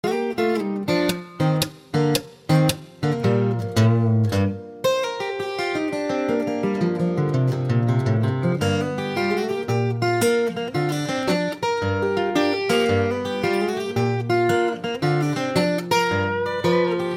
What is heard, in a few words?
blues